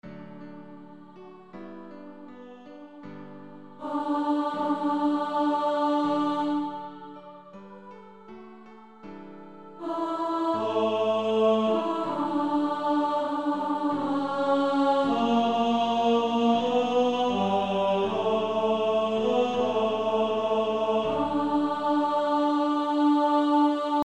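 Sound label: with Piano; 2nd Alto; Female Choir; SSAA 4-part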